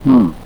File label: hmm